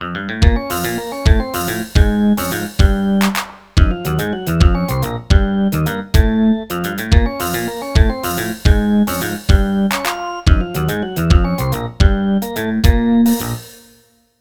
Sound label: ringtone